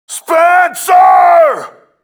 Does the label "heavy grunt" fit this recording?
Yes